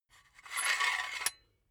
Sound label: silicone gun empty pusher push slow; berufe; handwerker; soundpool